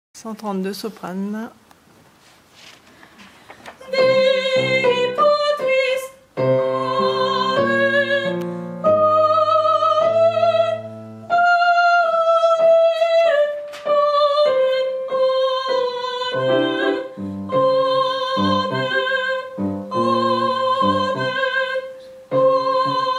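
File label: soprano; gloria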